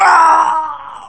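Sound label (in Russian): стон мужской боль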